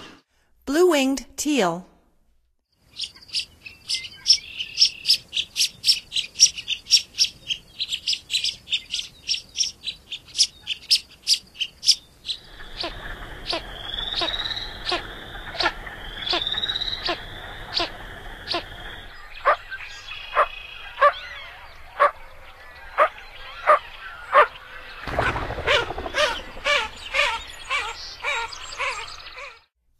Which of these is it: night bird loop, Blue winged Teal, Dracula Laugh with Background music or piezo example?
Blue winged Teal